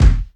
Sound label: original kick drum single hit e key, Royality free kick drum tuned to the E note. Loud; kick drum samples